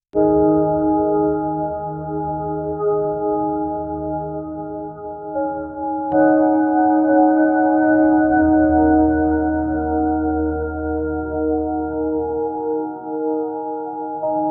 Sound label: minimalistic ambient musi; empty space